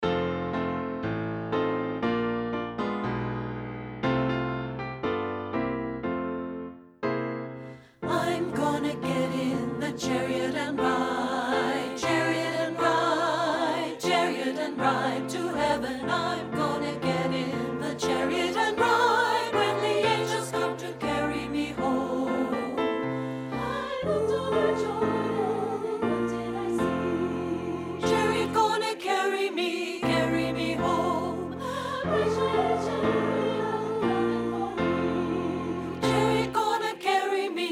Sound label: alto